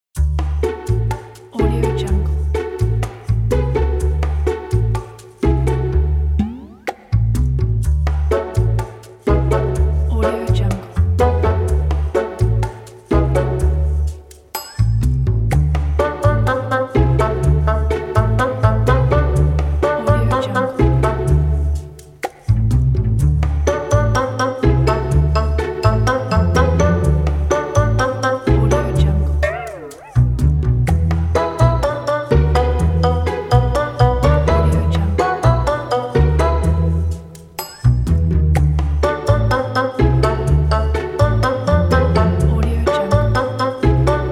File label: funny